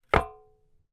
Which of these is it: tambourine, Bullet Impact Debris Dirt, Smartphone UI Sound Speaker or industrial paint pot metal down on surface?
industrial paint pot metal down on surface